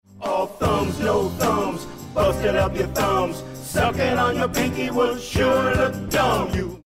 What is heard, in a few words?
thumb song